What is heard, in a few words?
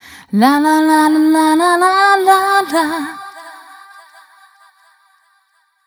f mel c, fem Vocals